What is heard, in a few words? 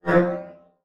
Beep Low Pitch; Multimedia Internet CD-Rom Flash